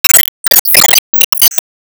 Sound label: Enemy Gear Active Loop